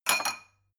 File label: glass shelve light clank